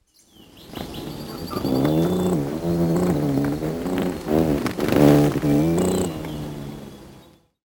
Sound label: Rubythroat Display